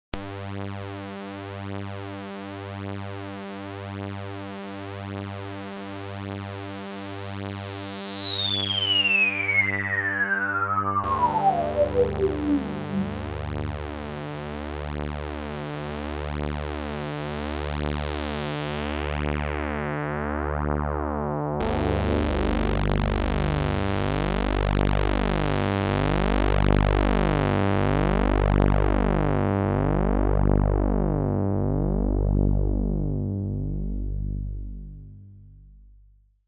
ppg wave filter

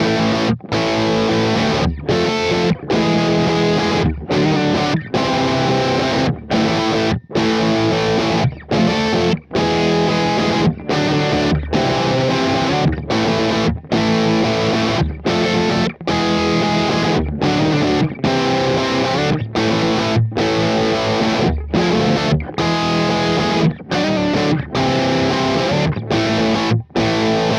Cort KX 500 Bridge Voice

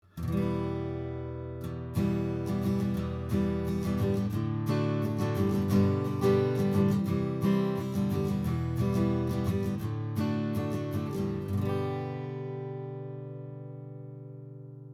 OC 818 Guitar Polardesigner Cardioid Back

